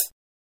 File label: Low, metro